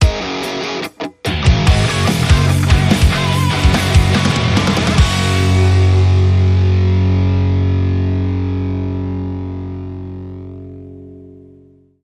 Funk With My Rock

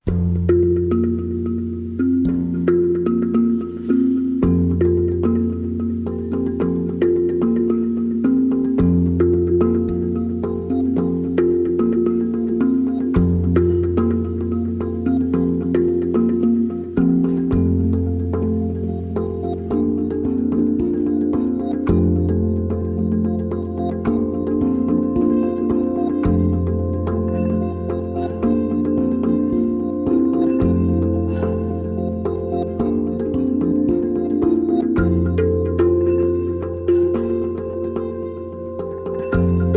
Hang Drum Jam, No Perc

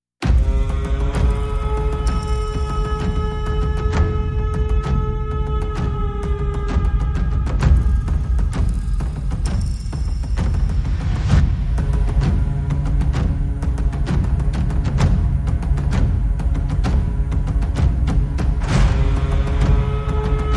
Tribal Mix

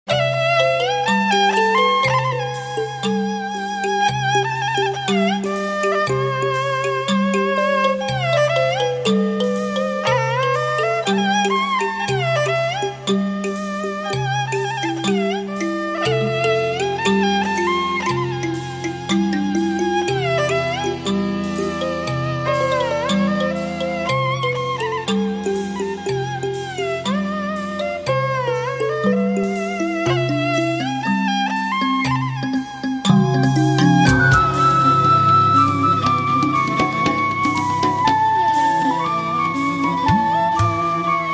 Ethno Travel

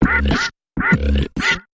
Mechanical Percussion Variation Short Version

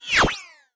Sharp Cut Sweep

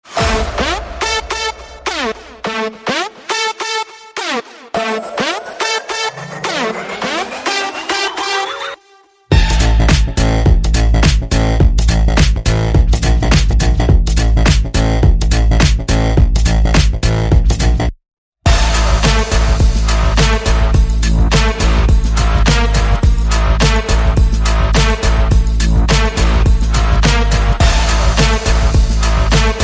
No Vocals

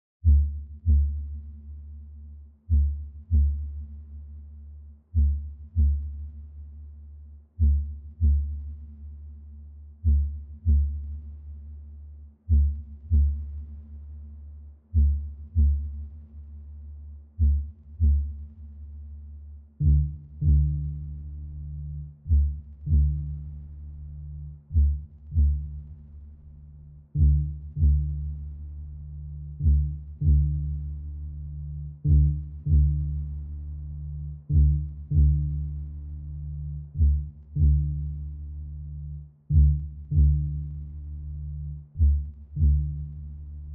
Bass Plucks

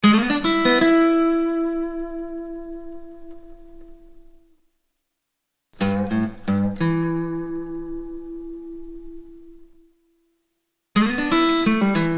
Blues Licks

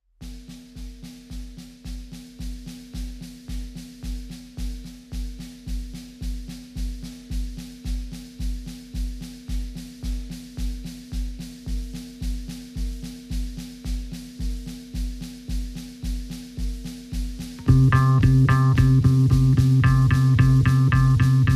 Drums and Bass Mix

